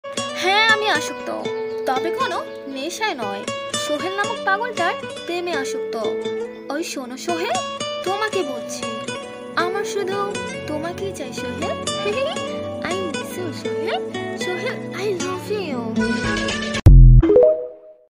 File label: bangla ringtone love ringtones; brittouch